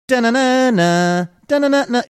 singing portion of

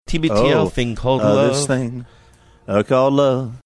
singing ; tbtl

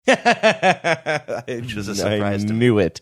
laughing saying i knew it

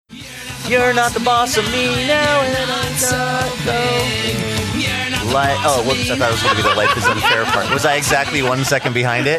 trying to sing along to with one second delay

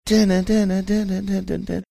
singing portion of song

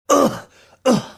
mimicking enemy neutralized sound

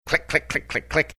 click click click click click

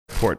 sniffling

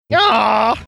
awwww

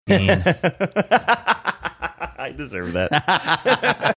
laughing saying i deserve that

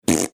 blowing a raspberry

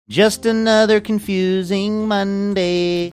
singing just another confusing monday

